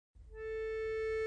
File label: step